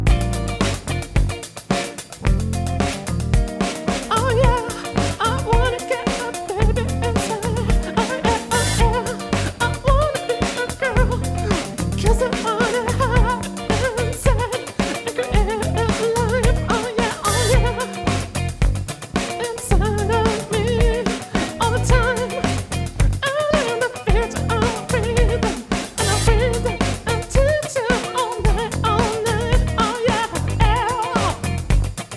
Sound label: Disco stem; musdb18; Music Delta